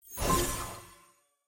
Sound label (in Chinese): 排位赛 匹配成功; nba